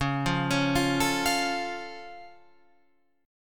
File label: C sharp Augmented, C sharp, down, Guitar, Open F; arpeggiated; JGuitar